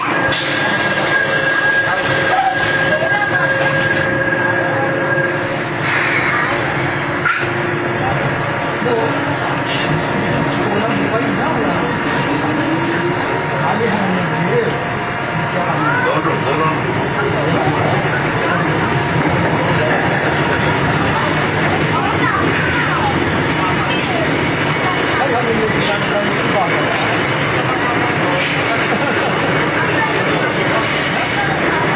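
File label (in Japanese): 走行音, 2号線, チョッパ制御車, ソウル地下鉄; Seoul; 上住十里(Sangwangsimni)-新堂(Sindang)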